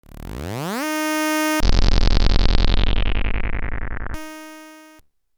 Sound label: Random Sound; Europa